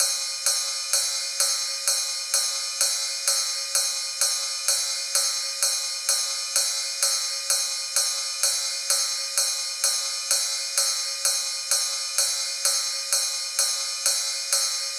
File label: ride, Key D#, Bpm 128; Big Room House; Loop Nerds; Big Room House Allstarz